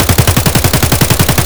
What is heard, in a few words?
ak74m outdoor close loop; Escape from Tarkov